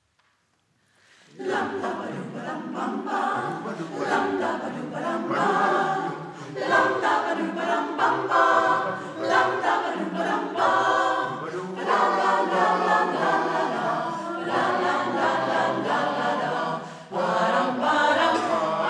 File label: Scandinavian shuffle; vox mundi 2019 concerts